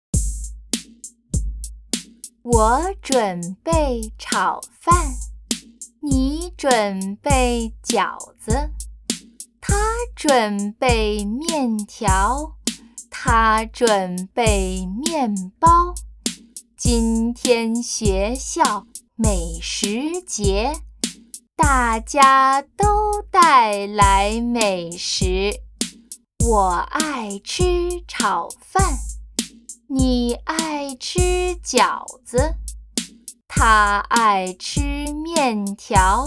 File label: Chant